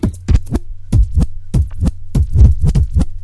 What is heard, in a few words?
scratch